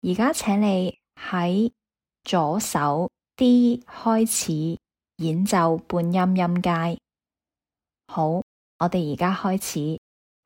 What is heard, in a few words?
chrom scale starting on d lh